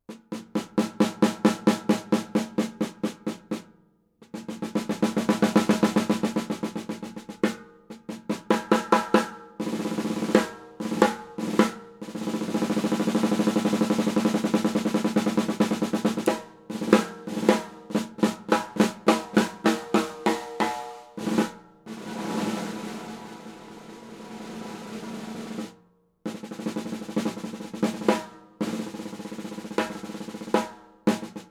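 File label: Craviotto 8x14 Oak walnut Stain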